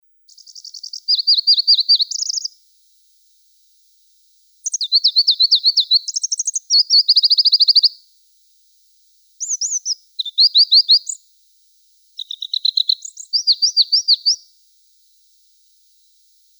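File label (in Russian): Penochka korolkovaja, Pallas's leaf warbler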